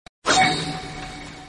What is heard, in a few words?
sound effect; jutsu; naruto